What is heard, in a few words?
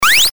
jump